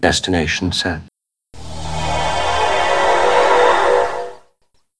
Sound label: blip